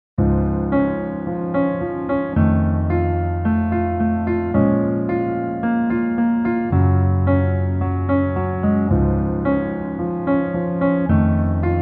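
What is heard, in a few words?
Piano version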